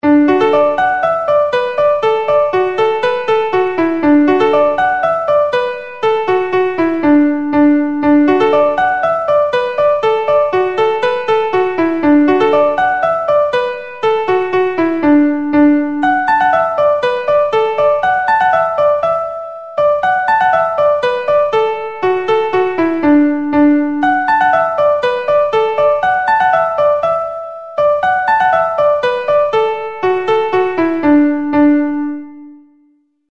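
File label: Polka, Traditional Irish Music; Ballygow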